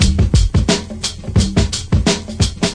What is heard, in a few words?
087 bpm loose change groove, drum loops and breaks